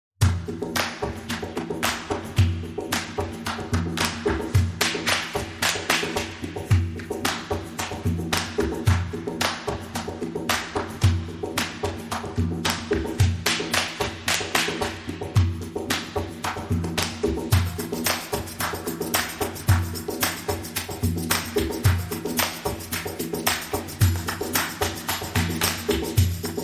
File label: Percussion Only